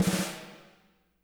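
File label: reverb snare, WET WHACKER; Spectrasonics; Burning Grooves CD2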